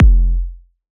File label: Big Kick